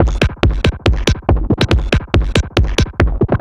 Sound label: Electronica Drumloop; Drums